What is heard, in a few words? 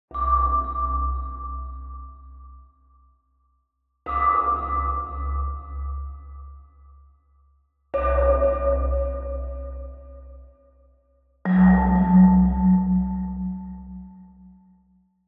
Arcade Click Negative